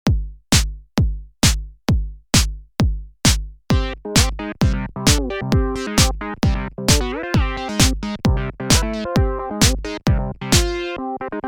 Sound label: Prophet 08